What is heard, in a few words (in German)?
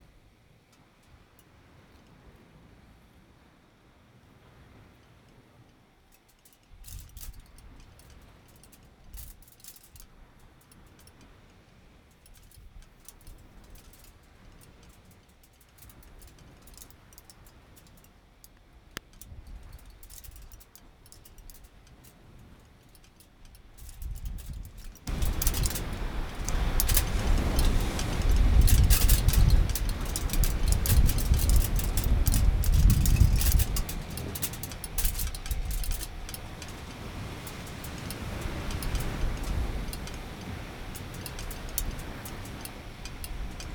Wind - Alu; Curacao; Fieldrecordings